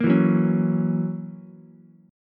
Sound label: F sharp 7th, Ukulele; strummed; JGuitar